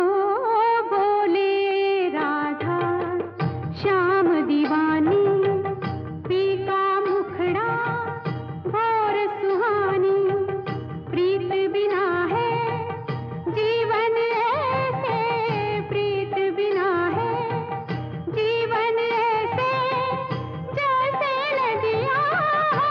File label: Ringtone